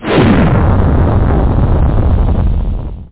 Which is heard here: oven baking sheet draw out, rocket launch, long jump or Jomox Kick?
rocket launch